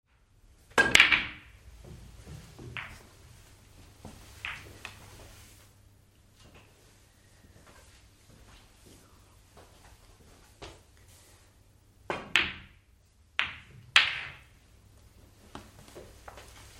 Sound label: billiards room; upton house